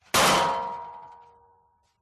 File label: pub plastic crate empty bottles slam on wood surface; field reports